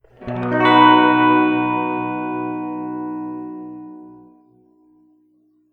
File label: spring medium sustained chord